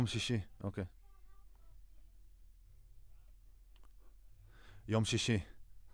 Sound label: Voc